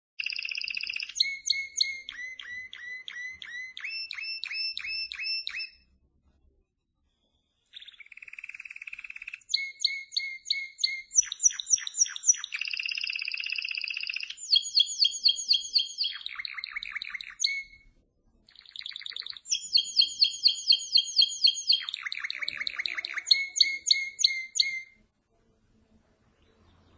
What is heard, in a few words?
Canary, Bird Songs